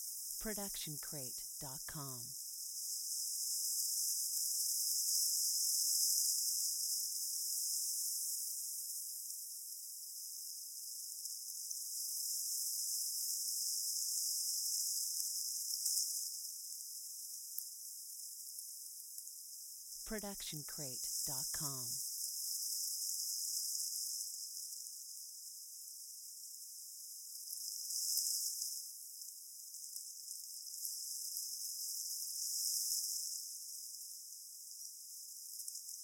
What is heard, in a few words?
Night Time Insect Swarm Noise; SoundsCrate